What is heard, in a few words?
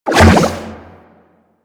Aqua Board Spin; Warframe; Yareli